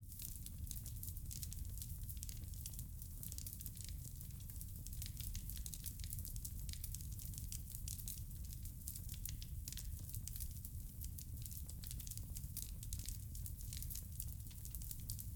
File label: Fire burn Fireplace Artificial Crackling Roar Panned Left Wooden Room